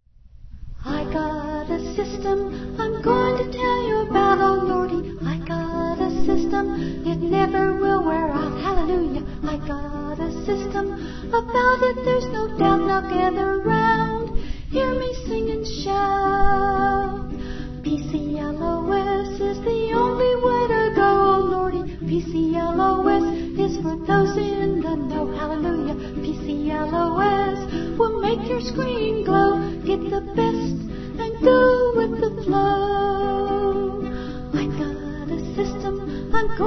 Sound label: sing and shout